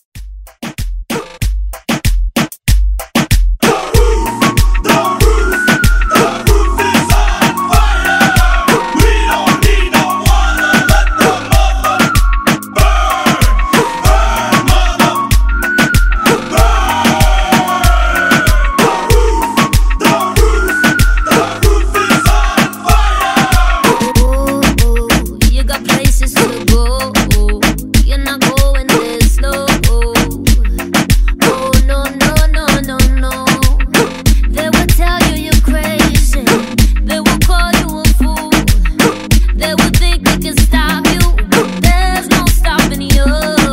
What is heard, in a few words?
Reggaeton Hype Redrum